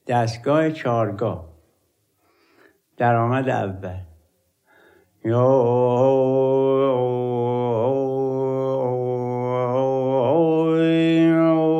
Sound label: aramad; chaharghah